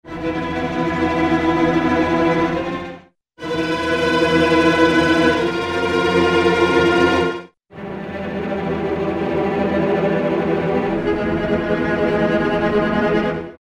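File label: Strings Cellos Tremolo p; Roland L-CDX-04 Strings And Orchestral Percussion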